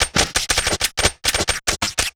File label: Musket Dry Fire Multiple; Period Combat; Sony Pictures Sound Effects Series